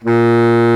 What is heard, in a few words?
BARI SAX A#2; Greytsounds VOL-1